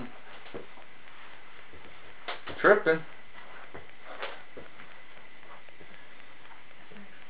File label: footsteps